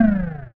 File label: monster hit